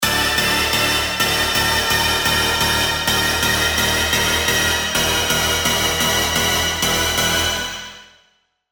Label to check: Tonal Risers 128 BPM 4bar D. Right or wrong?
Right